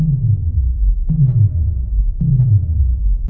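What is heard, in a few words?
diver loop, synth; video game; ambient; source engine; portal2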